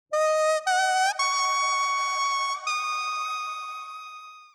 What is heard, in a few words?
kusabue short; anemoi